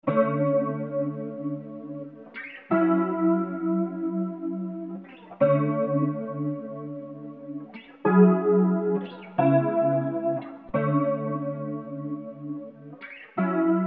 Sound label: guitar electronic song